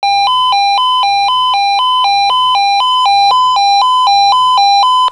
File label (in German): Melder